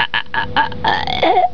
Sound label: death; quake2; postal